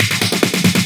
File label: Fill 140 BPM; Vengeance Samples; VEC3 Loops 140 BPM